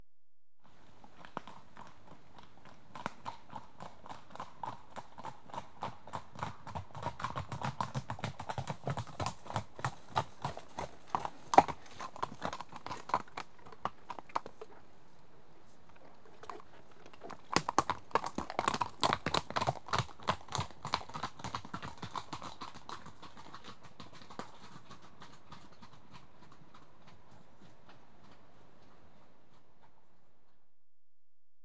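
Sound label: Two horses at trot, on rough track; BBC Sound Effects Library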